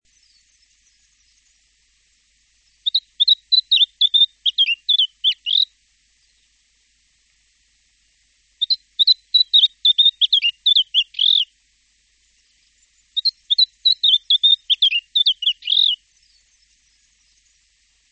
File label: Flame robin